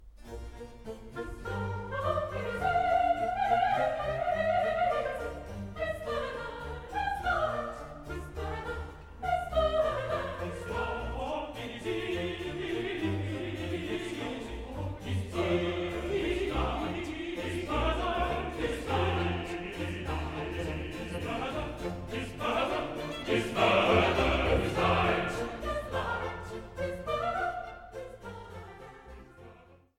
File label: his yoke is easy, chorus; part 1, no 21